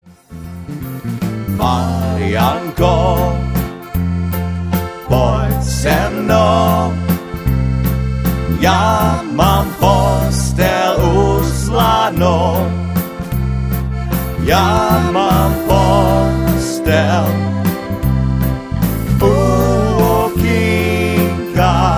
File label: Waltz